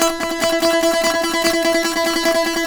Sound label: SAZ; Spectrasonics; Hans Zimmer Guitars VOL-2